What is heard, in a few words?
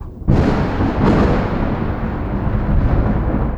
THUNDER; Best Service - XXL Production Effects